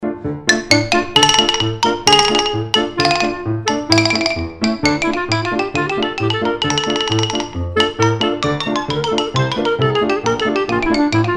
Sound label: Khasene March, Klezmer Band